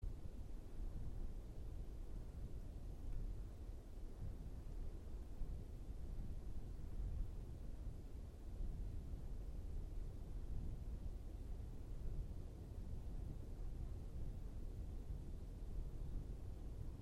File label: woodknocking